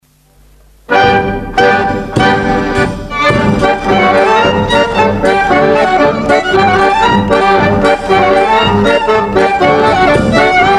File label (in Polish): polka